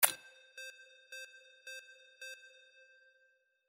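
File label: rollover reverse; windy beach audio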